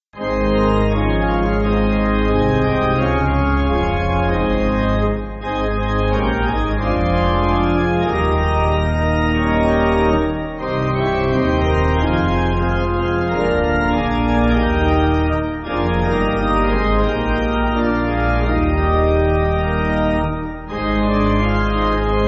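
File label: Pipe Organ, church backing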